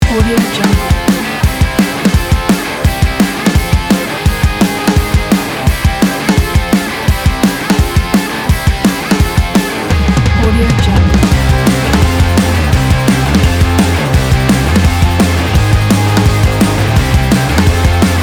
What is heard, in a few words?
dirty garage pop punk